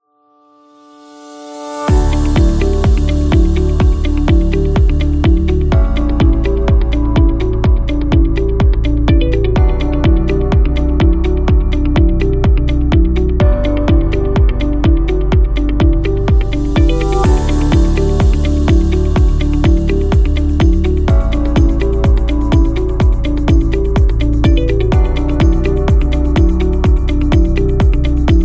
This Corporate

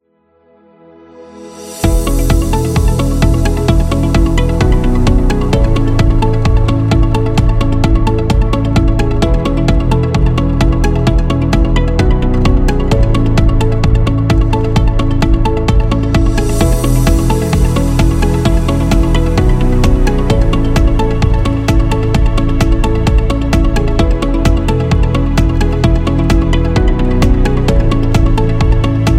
Corporate

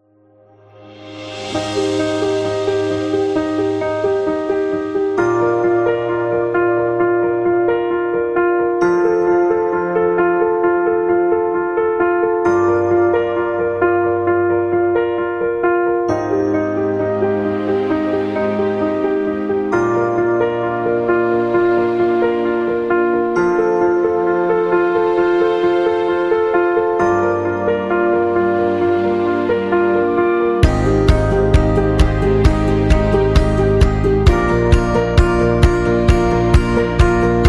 Elegant Fashion, Corporate background music

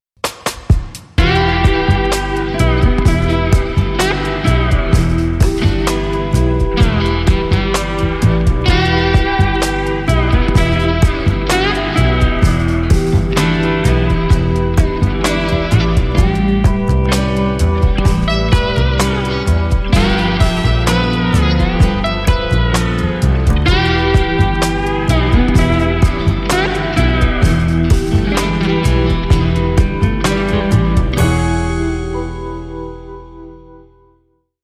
Logo Blues; blues intros

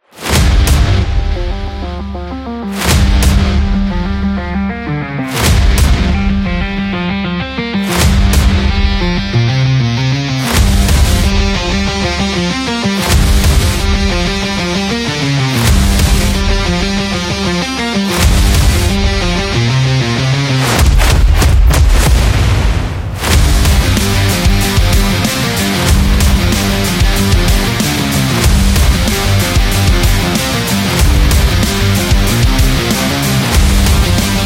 The Indie Rock

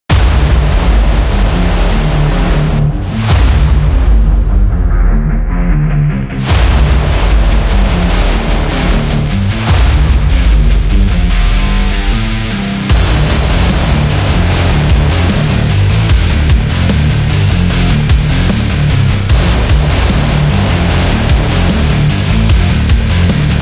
Martial Art Fight

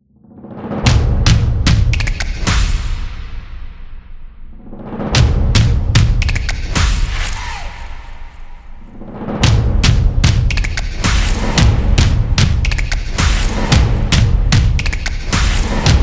Opener Intro

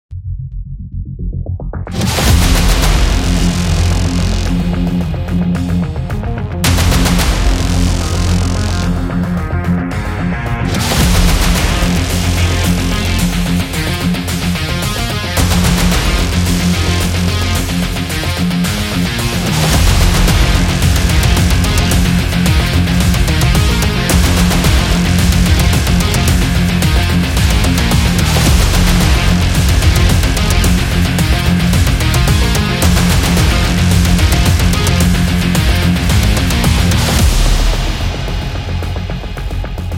A Rock Trailer

